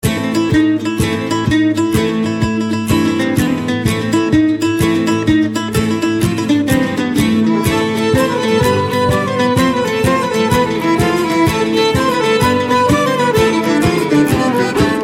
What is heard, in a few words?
Jigs